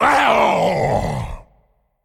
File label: Muckling Emote Death